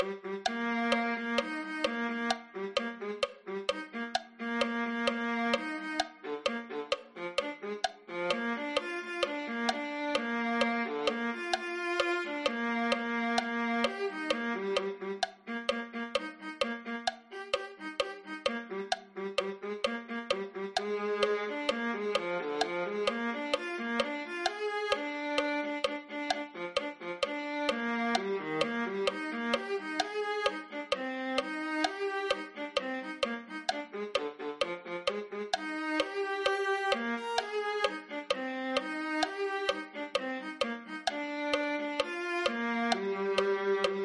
viola

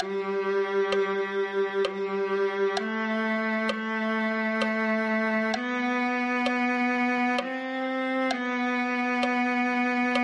viola

